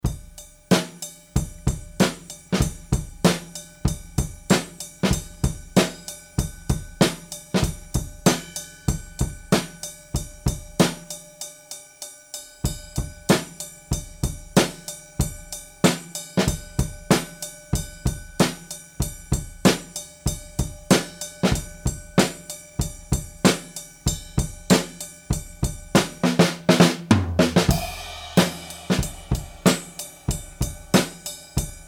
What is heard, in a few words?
shitty drums 95